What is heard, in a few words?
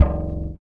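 soul electric bass; Roland LCDP02 Guitar and Bass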